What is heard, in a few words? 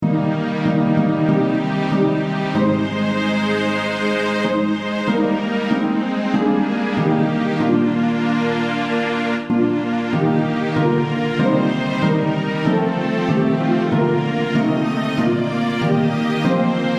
Harp Viola Violin Violin; Crown Him